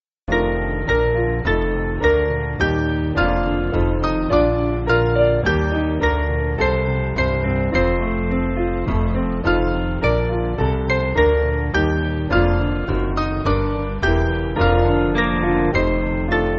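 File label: Piano, Music Backing; Hark The Glad Sound; St Saviour; Mainly Piano (CM)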